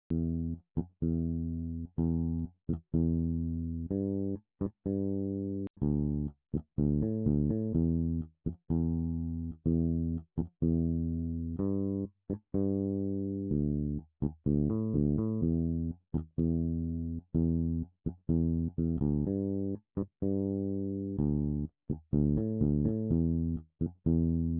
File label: bass